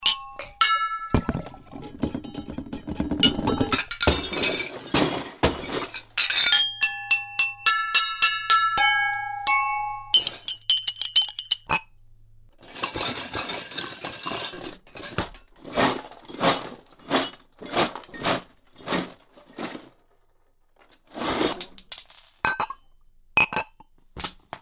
hieu ung am thanh do thuy tinh

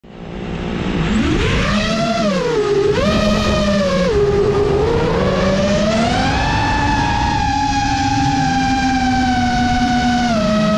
Tiếng FPV Drone bay chân thực; âm thanh gốc (Raw sound of FPV Drone) không chỉnh sửa

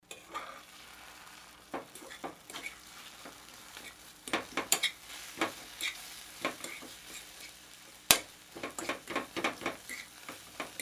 Am thanh an trung lon bang thia

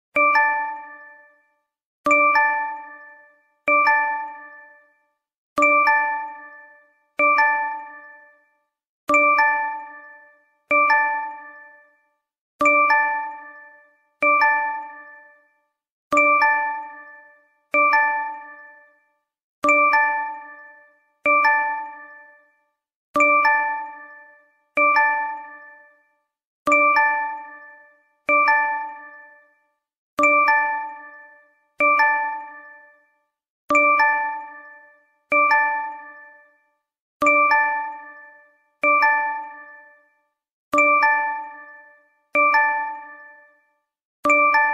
tieng thong bao tro chuyen line nhay len lien tuc; troll

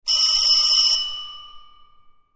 chuong truong hoc ngan

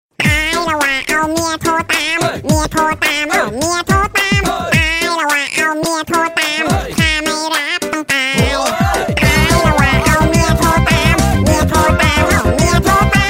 nhac chuong android; เสียงเรียกเข้า Android

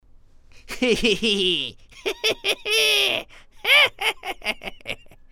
tieng soi cuoi gian giao nham hiem

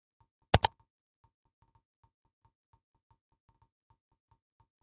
Tieng tam dung video

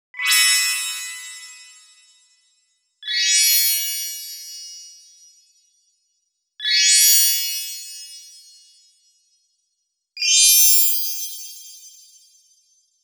Am thanh anh sao lap lanh anh sang

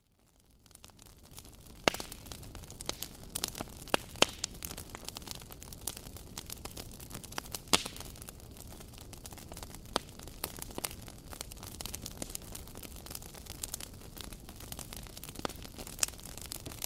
tieng giay chay dot chay to giay

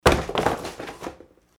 Tieng hop cac tong roi tren mat dat

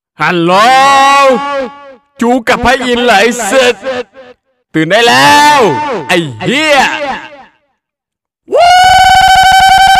nhạc chuông báo thức cool

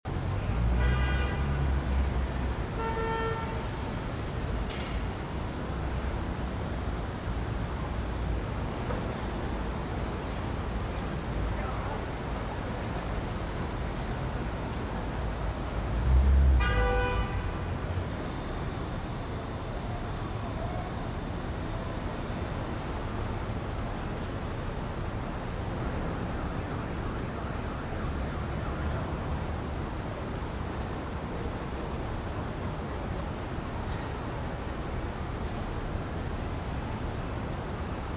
Tieng on ven duong thanh pho

